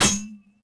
hit metal; impacts explos; cortosis

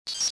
fuelpump; ambience; Star Wars Jedi Knight II Jedi Outcast; XBOX

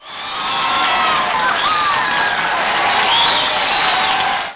crowd cheer; Chase Hollywood Stunt Driver; XBOX

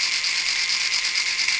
Robot Loop; BedRoom

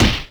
punch

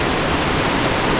waterfall; Ambient; MX vs ATV Unleashed; XBOX

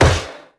cymbal block

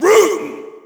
Shout, audience; UFC Tapout 2

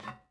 metal tube, footsteps

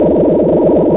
ammo con run, interface

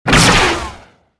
alt fire; weapons bowcaster

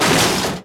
crush, Impact

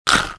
bite; mine

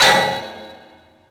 pipe hit; projectiles; bullethit; Max Payne

